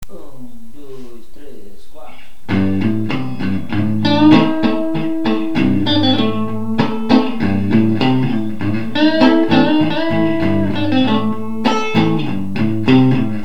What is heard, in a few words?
blues